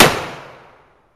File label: blackpowder revolver